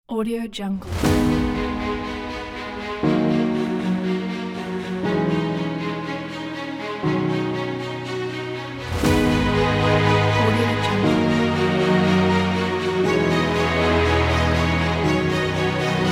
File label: cinematic epic trailer